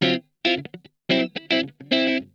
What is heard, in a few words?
STEAMIN, Funk Guitar; Zero G - Funk Guitar